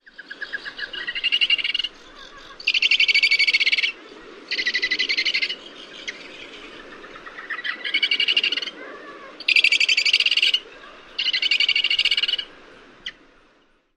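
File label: hoarse chittering, Parakeet Auklet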